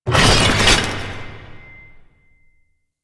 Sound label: Customization UI Equip; Veilbreaker; Kahl